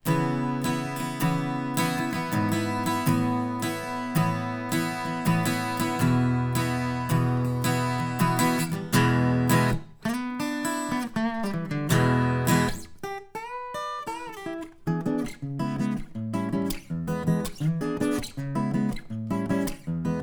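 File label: alt guitar 1 vanilla cls; altgtr2vanillacls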